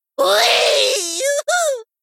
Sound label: laugh; svencoop; gruntsparty; psychward